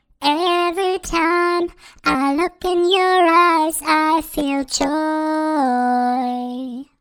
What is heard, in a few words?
Vocals Pitch And Throat Childify; Antares